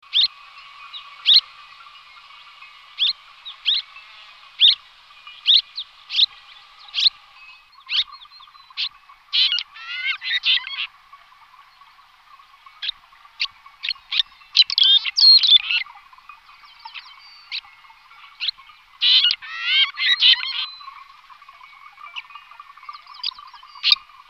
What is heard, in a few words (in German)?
Roetelschwalbe